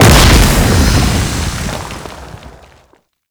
Octopede Unholy Ground Geyser; Warframe; Man In The Wall

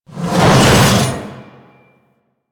Golem Lift Scythe; Warframe; Attacks

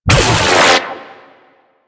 Pilot Radial Aura; Warframe; Amalgam Carrus; Abilities

